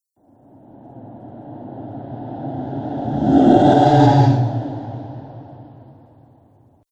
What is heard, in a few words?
Ghost Huge And Mighty Whoosh